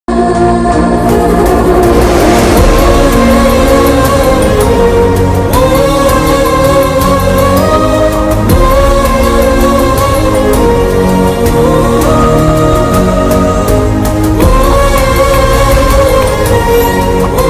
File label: emotional bgm ringtone sad bgm ringtone; guntur kaaram